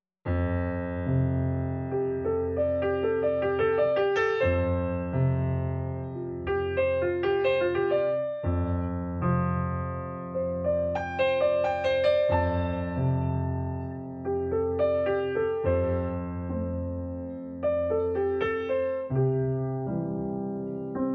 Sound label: PIANO